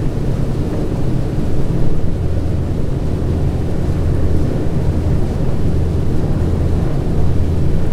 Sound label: c46 cockpit l, engines; War Thunder